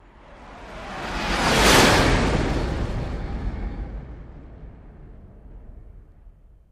Starship, Small, By from Right to Left, Point of View of Pilot